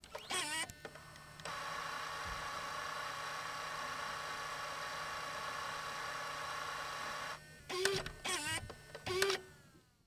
Technology Electronic Camcorder Panasonic Dvx100 Rewinding The Tape